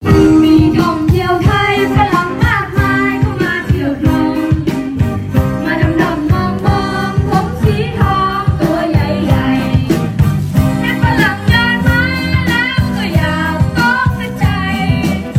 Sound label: Kids Band; Luang Prabang